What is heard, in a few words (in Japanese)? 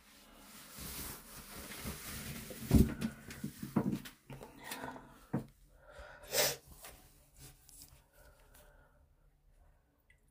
風邪を引いている時の……オシッコですッ！